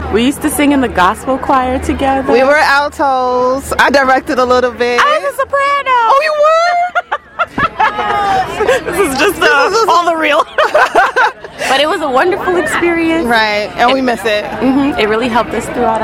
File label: Gospel Choir